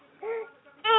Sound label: crying, baby cry